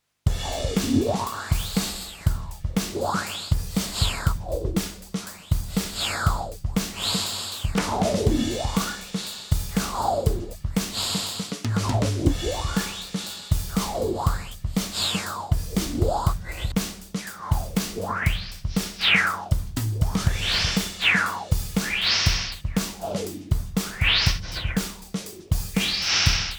Synth Drumloop Dimension; infinite jets

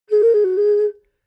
Xun Sustains ornament; Phoenix Orchestra

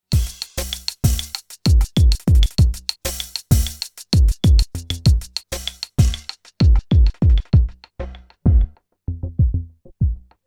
Drumloop; Io Instruments Euporie

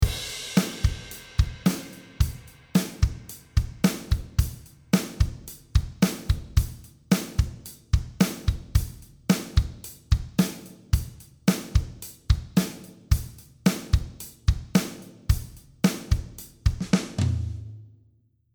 altiverb 8 xl drums; audio ease; clearmountains mix this

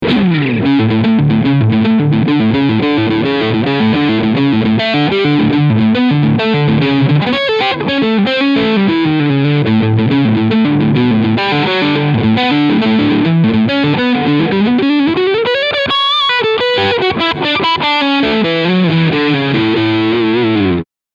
Riff Crunch

